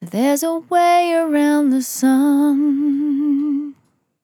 On A Star 65bpm F, Vocal; Zero-G

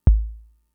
kick, Fricke MFB-522

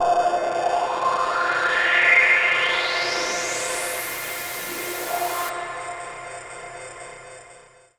Sweep; noise

